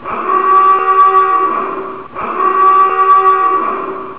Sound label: dive 2 loop